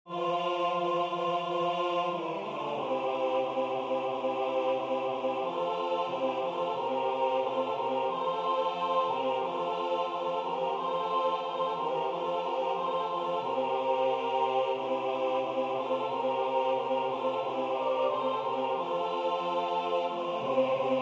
Spanish Christ is Risen, music that we sing at All Saints